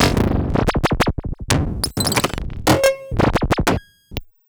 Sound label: FM Bleep Loop; Polyend; Stazma & Concrete Collage